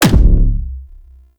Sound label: Concrete Archgun Punch; Warframe; Archwing